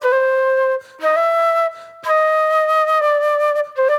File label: Soul Plate Flute